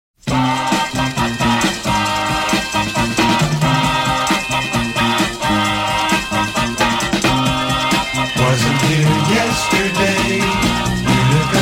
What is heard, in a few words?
Beer Drinking Song; Utica Club circa 1968